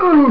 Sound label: Harmony; Sapiens 2.0